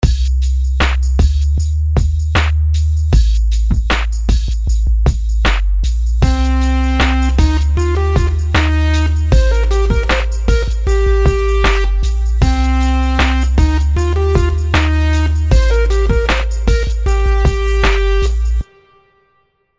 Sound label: rhythms simple 16ths